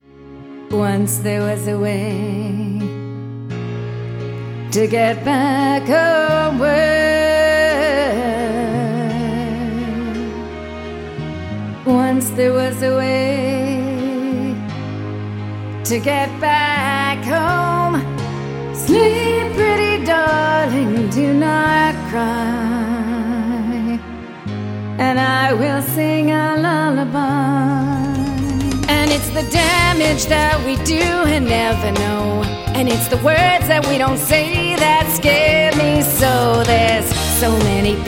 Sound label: Vocal